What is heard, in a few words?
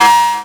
Multimedia Internet CD-Rom Flash Ding